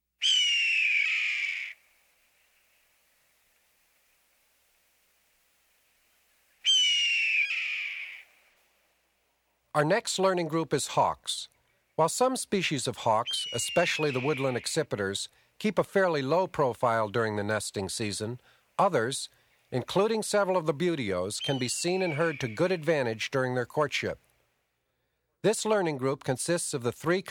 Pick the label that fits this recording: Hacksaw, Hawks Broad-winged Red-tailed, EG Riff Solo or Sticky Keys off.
Hawks Broad-winged Red-tailed